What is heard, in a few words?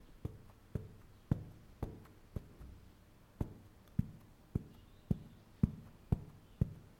Walking On Carpet